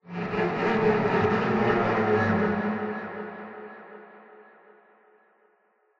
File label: Npc Combat Strings